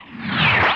BOXIN; Marbles

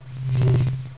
ELEVATOR

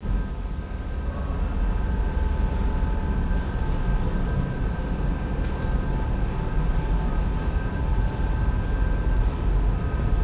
boat, transpo sea; WAV Sound Effects

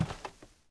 carpet; ambient; Shadow Man

